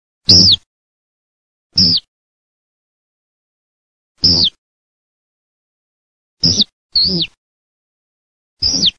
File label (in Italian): ALLODOLA